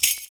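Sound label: PERC, percussions; Univers Sons - Raricussions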